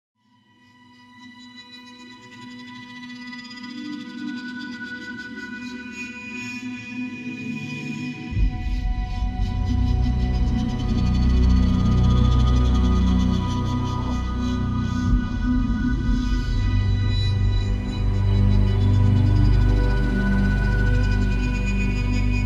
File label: Epic Dark Brooding Orchestral Music